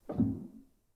rel, Grand; bitKlavier; Binaural

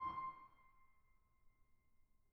harm C6, bitKlavierGrand Binaural

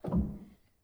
rel, Grand

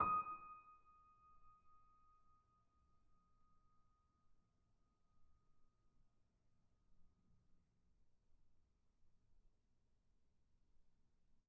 D#6, bitKlavierGrand Binaural

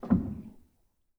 rel, Grand; bitKlavier; Binaural

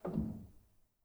rel, Grand

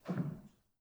rel, Grand

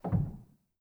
rel, Grand

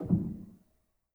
rel, Grand

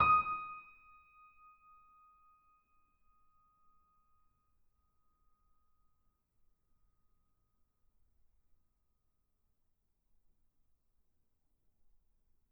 D#6, bitKlavierGrand Binaural

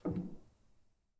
rel, Grand; bitKlavier; Binaural

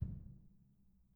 pedal U, bitKlavierGrand Binaural

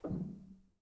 rel, Grand

